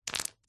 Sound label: stones handful set down on tiled floor; foley